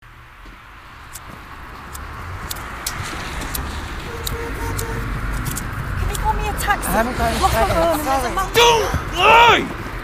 Rain soundtrack

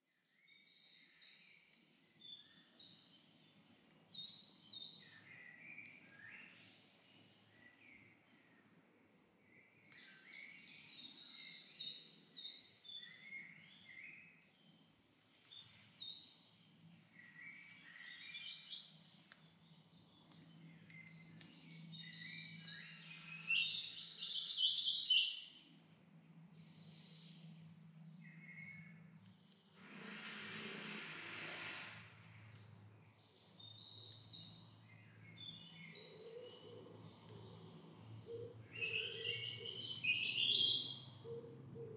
forest dawn

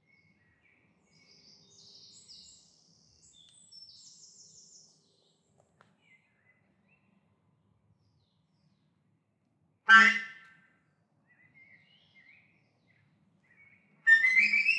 forest dawn

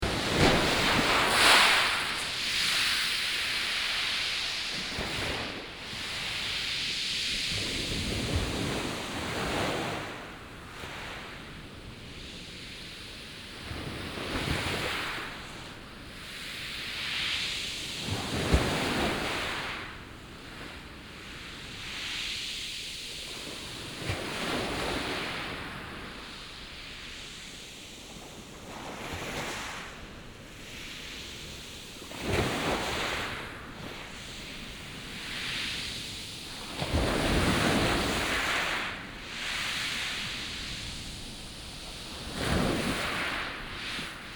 Durdle Door Waves Small Pebbles, field recordings

